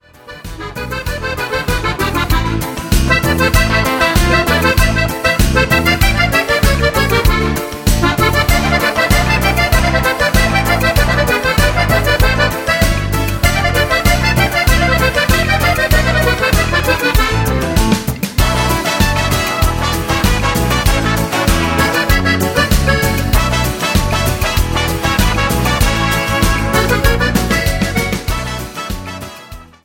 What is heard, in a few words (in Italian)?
Cumbia italiana; 97 bpm